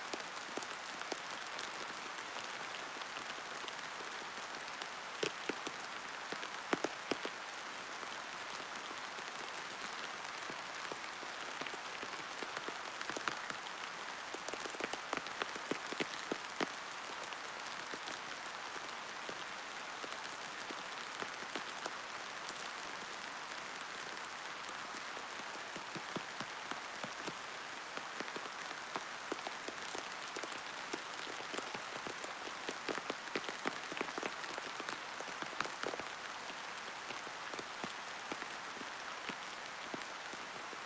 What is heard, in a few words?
rain moderate drippy; sonniss gdc